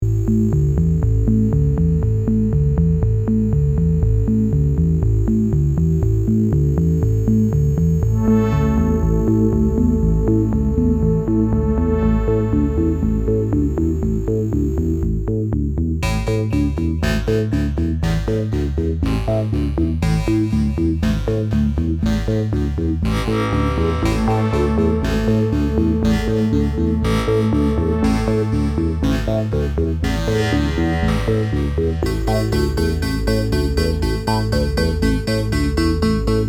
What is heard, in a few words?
The Digital Crunch Groove; daily-groove